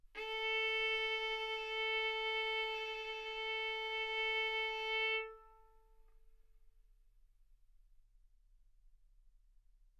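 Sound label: Violin arco mf A string A4 B4 stereo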